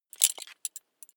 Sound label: metal belt buckle clatter heavy